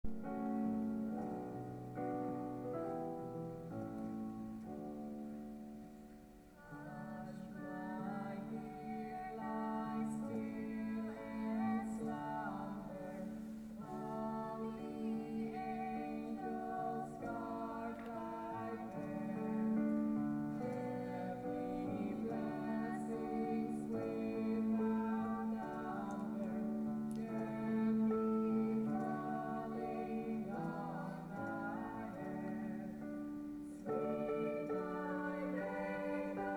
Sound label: Cradle Hymn